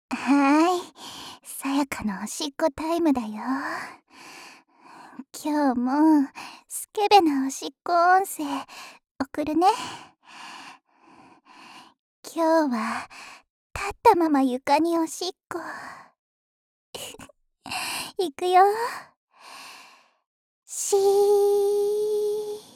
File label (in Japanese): 今日のおしっこ その場でおもらし